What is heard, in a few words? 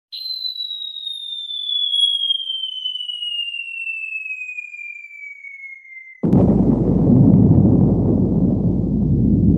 whistling bomb